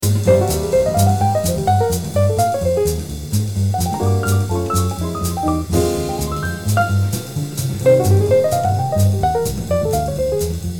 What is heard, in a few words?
alto melody